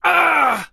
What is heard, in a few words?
pain; st allies; darkwing